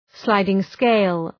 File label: sliding scale